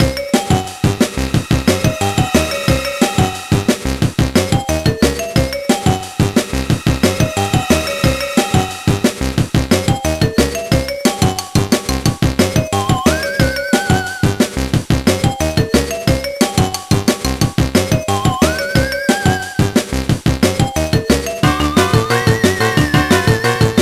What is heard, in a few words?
Boss Battle; chicken little